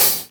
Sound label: Open Hihats, Cymbals; Vengeance - Essential Clubsounds Vol.5